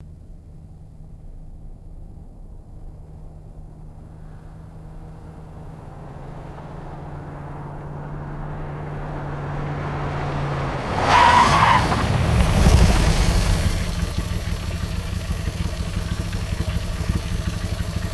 vintage car fast approach stop and turn off

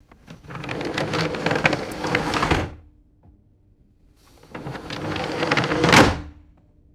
wooden roll top desk sliding open and closed; drawers

